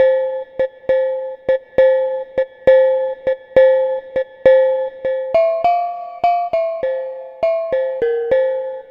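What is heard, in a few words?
Pelog Bonang Melody; Melodic; Ambient-Melodics-II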